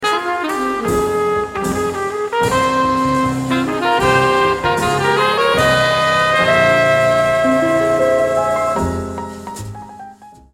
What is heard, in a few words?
interlude